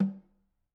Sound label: Snr Off, drum